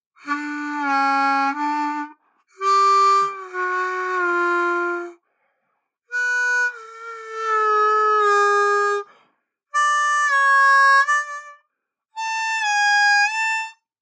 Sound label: Draw Bends, harp; Neuma